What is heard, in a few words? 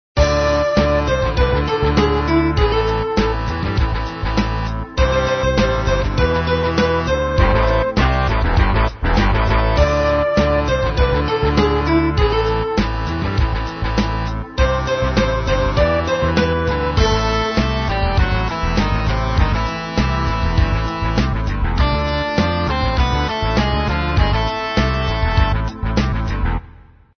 midi